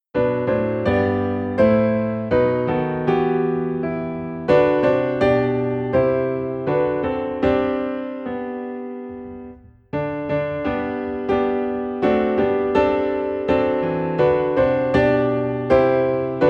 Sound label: Piano in G